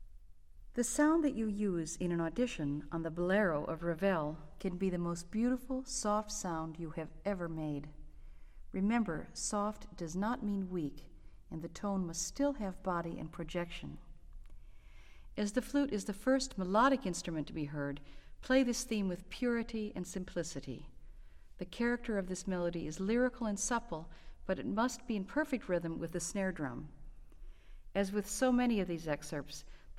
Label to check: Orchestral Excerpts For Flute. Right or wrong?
Right